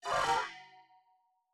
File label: aquarium boss voice Attack; unsighted; enemies